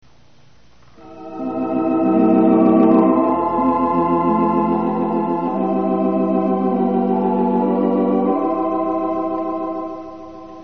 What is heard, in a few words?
Choir; Jesus is Tenderly Calling; Gospel Center Choir, Durham, NC